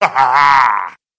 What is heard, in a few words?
monster deranged monk